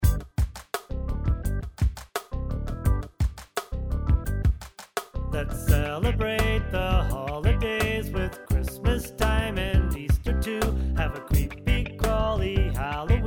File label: Practice Vocals